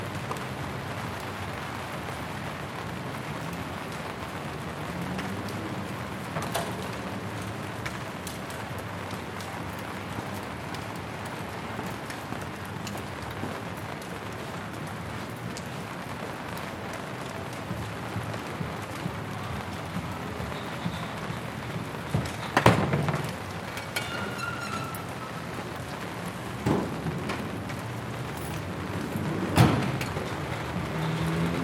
rain at redchurch street

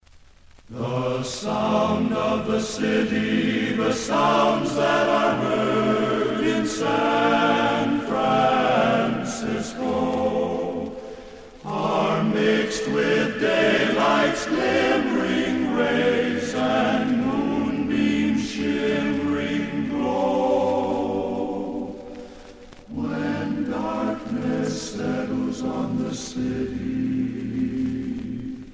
jingle

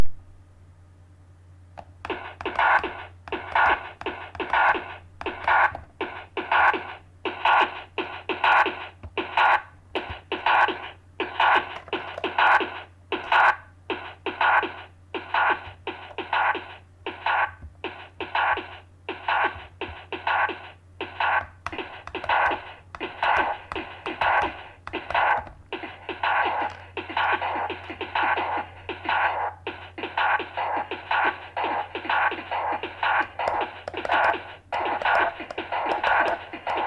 beats

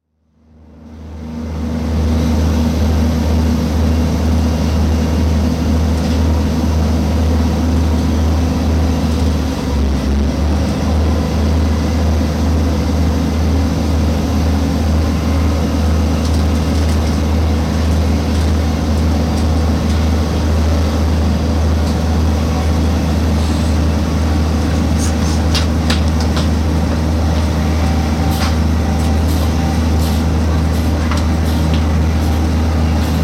train idling just outside templecombe station

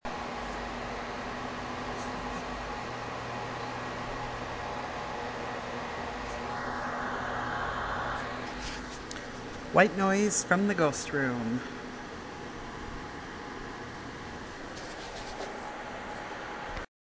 ghost boo

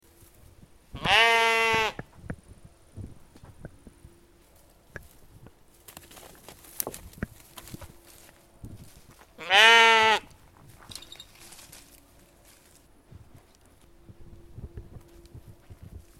finnish sheep

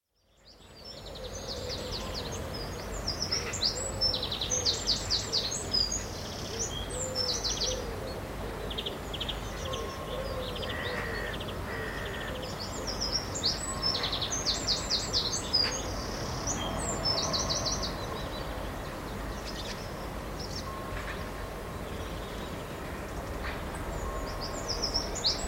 radio birdsong

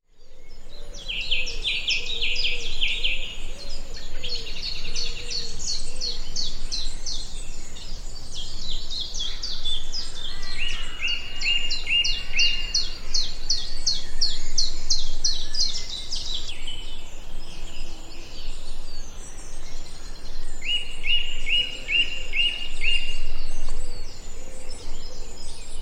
birdsong

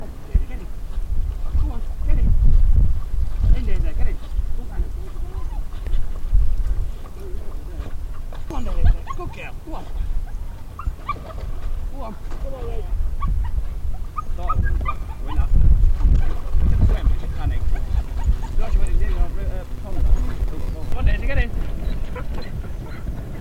dogs in water

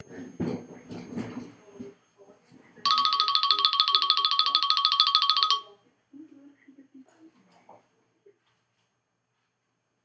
ring tones

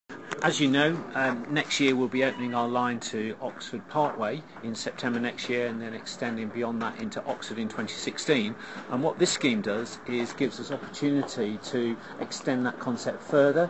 cowley line train

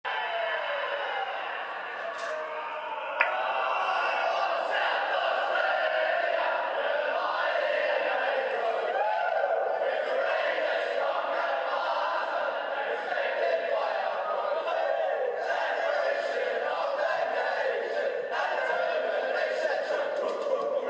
club song; after r4 win

